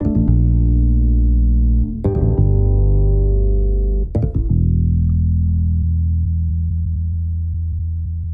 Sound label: 115 Cm Soul Searchin; Touch Loops; LA Beat Scene MiniPack